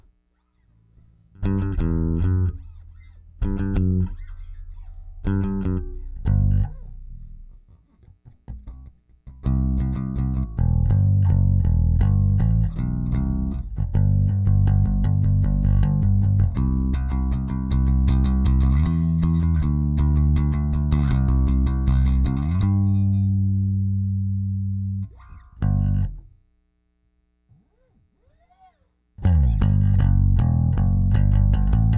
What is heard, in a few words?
Bass